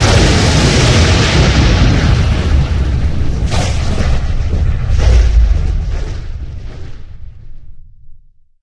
Piezo Really close, Explosions; DynamicEuropa

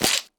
limb slash; Damage; Barotrauma; Subsurface

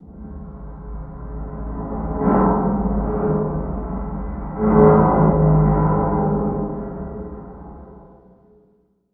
Hull; Room FX; Barotrauma

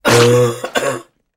female cough; Barotrauma; Real Sonar

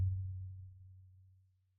sonar Tail Air Far Beacon Directional; Barotrauma; Real Sonar

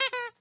Rubber Duck; Impact; DynamicEuropa

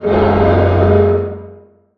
damage; Barotrauma; Barotraumatic; Carrier

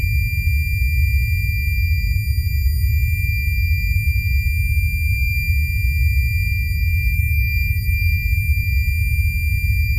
tinnitus; Barotrauma; Real Sonar

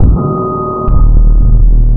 sonar Ping Suit Very Close Beacon; Barotrauma; Real Sonar

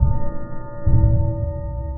sonar Ping Suit Medium Beacon; Barotrauma; Real Sonar

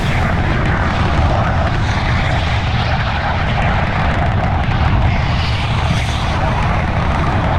jetpack loop; Barotrauma; barotrauma ek

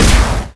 medium fire, weapons; EK modern; Barotrauma

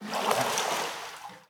Splash, Water; Barotrauma; Subsurface

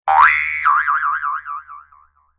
clown spring; Barotrauma; Barotraumatic

